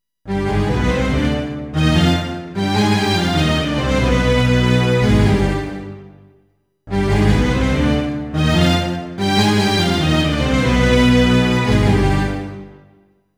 Middle East Riff, Keyboards; Sonic Foundry (Sony Creative Software); World Pop

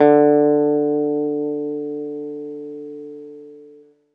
ZITHER, Alpine Volksmusik

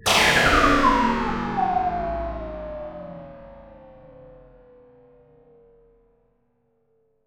faller

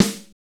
SNR DRY, Snares, Roland; Northstar - Drumscapes Roland

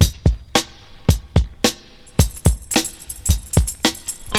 breaks

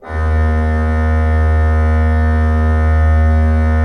D1, Cathedral Organ; ILIO; Propeller Islands

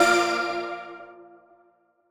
Old Skool A#3; KG MegaSaur; M8

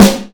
snare; Drum Hits

